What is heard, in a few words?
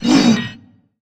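heavy movement of huge metal machine tool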